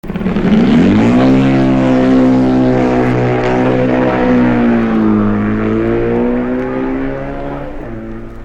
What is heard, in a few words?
BMW